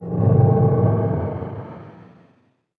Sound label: sys shield front